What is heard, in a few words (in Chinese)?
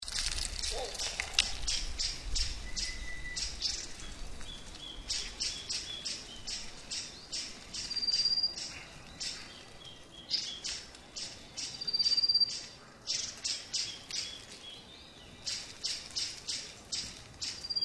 白腹鶇; 鶇科/冬候鳥